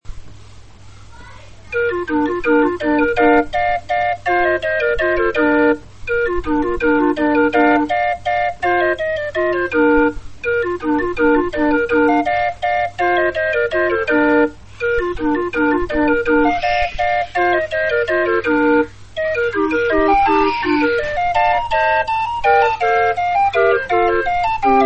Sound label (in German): floetenuhr